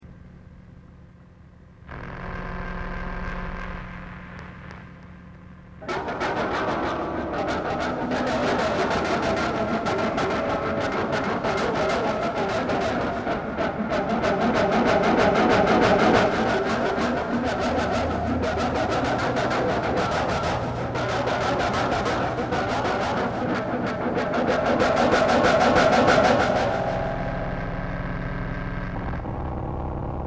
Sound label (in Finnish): kitara + delay